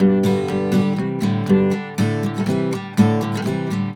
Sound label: GTR6, Celtic Flavours; USB Soundscan vol.42; AKAI